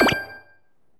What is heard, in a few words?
LEVEL BASIC; maimai